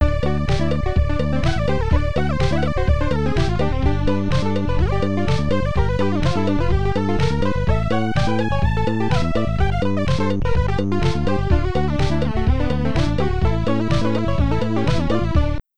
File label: Level Complete; qix amiga